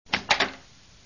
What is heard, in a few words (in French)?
texture porte